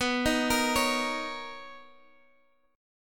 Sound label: B Major 9th B, Guitar, Drop D